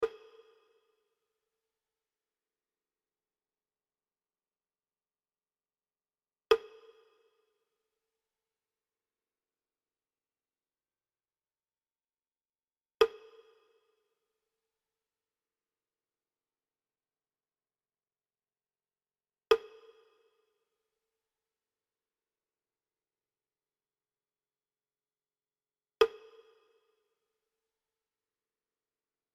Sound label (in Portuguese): amostra de pulsos com periodicidade